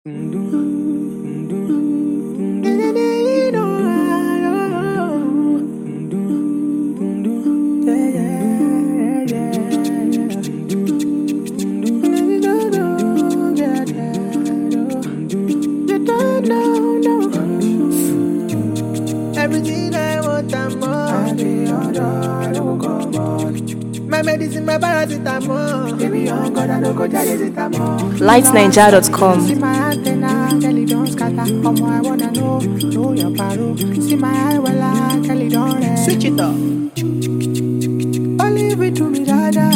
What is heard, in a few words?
Acapella Cover